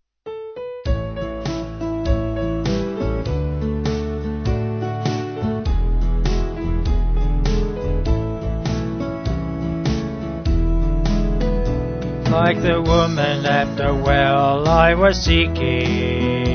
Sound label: Vocals Band